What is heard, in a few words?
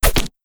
punch with a hard whistle